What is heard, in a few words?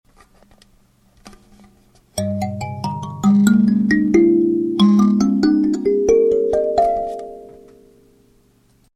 kin G, Kalimba; silvermooncreations